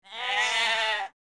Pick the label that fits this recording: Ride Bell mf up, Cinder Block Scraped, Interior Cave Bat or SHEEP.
SHEEP